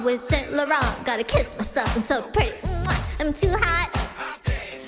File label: Mwah